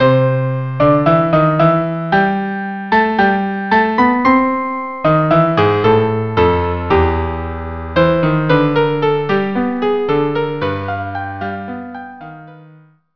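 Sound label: violin, cello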